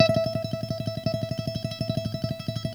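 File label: classical pick, guitar; Greytsounds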